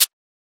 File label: Shaker; FLPRO